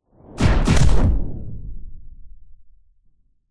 science fiction weapon incoming bullet hit with whoosh; zapsplat